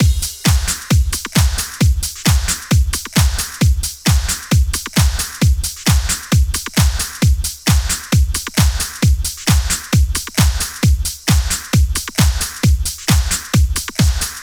133 House Beat; Dance DJ; Drums; House Beats; Pro Tools Essential Loops; Digidesign

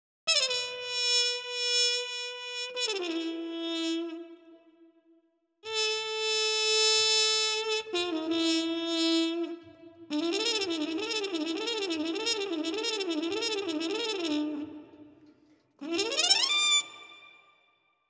Jazz Trumpet Dmin; Brass; Pro Tools Essential Loops; Digidesign

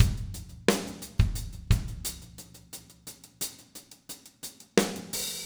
088 Rock Drums Verse; Pop Rock; Moderate Tempo; Pro Tools Essential Loops; Digidesign

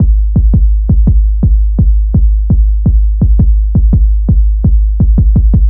HipHop Kick 808; Drums; Pro Tools Essential Loops; Digidesign

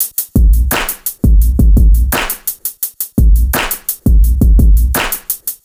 Hip Hop Beat, Drums; Digidesign; Pro Tools; Pro Tools Essential Loops

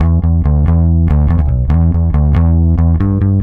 Garage Bass Emaj; 140; Dance DJ; Digidesign; Pro Tools; Pro Tools Essential Loops

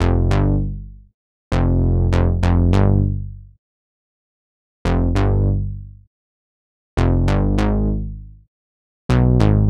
Latin HipHop Synthbass Gmin; Digidesign; Pro Tools Essential Loops

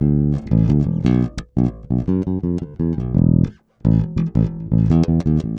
086 Old School Bass D, E-Bass; Pop Rock; Pro Tools Essential Loops; Digidesign

